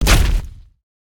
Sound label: hammer